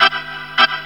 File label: ORGAN BRK; ORGANS; Ragga & Dub Reggae